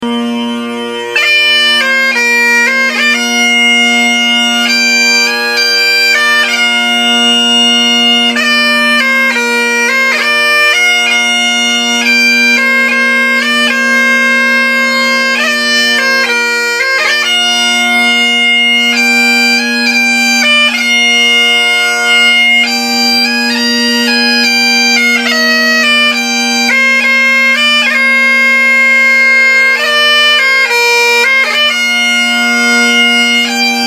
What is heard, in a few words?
PIPES, bagpipes